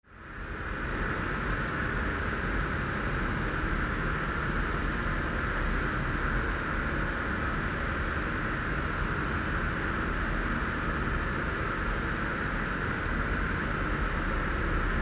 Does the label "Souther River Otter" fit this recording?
No